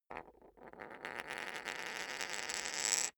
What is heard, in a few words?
poker chip spin; soundpool